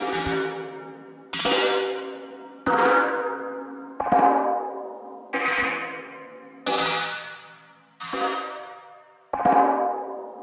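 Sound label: 90 Am synth EchoSkank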